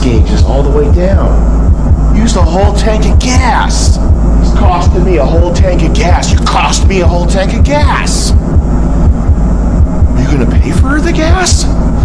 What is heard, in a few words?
gas